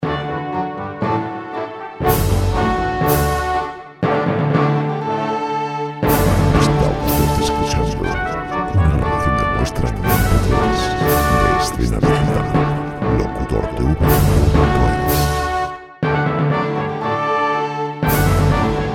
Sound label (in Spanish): orquestal